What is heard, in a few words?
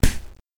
Sound Menu Intro Punch Sound; Learn to Fly 3